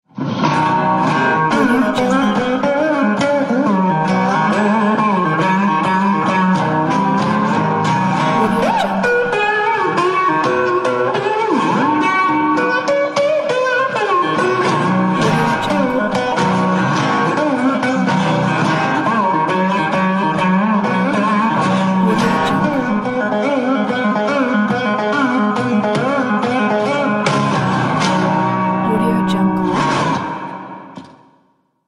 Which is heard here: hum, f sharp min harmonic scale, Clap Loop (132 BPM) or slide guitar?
slide guitar